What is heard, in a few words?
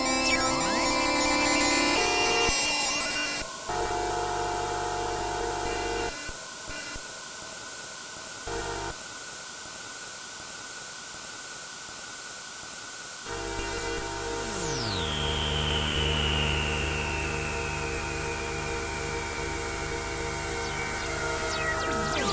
dissonant noise